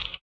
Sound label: slip slap; Spectrasonics; Metamorphosis